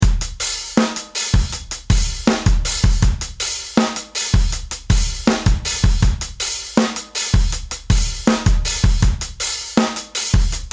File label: broken hi hat 80bpm